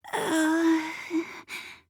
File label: argh; mukuro